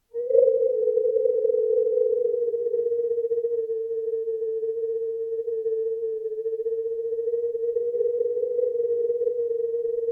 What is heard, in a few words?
Flexible Pipe - BLOW - Long - Low Intensity; Pole Position; The Plastic Textures Library